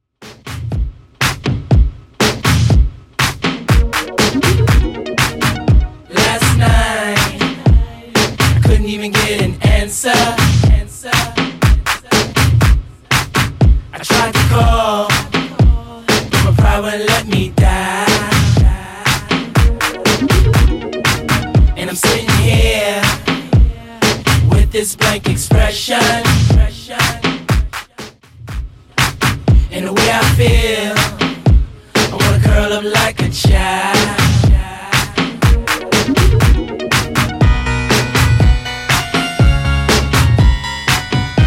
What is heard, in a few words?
Extend Intro 121bpm